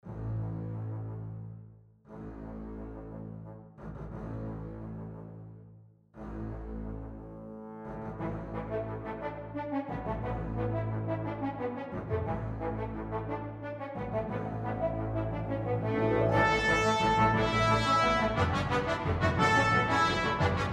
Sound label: Fanfare